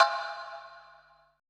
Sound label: BLOCK, PERC; BPC PERC